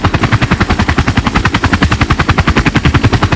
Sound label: uh60 loop, heli; wac